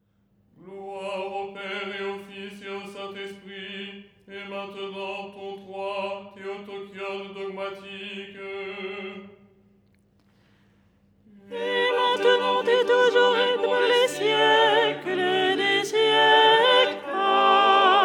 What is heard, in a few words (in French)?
soprano; ton 3